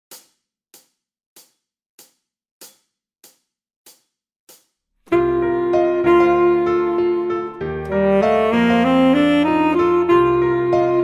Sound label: Soul Track Full Sax